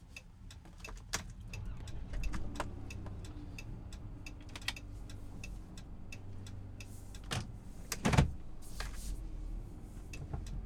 Chrysler onboard startup various acceleration deceleration gearing shutdown interior, Chrysler Town & Country 3.6L VVT; Pole Position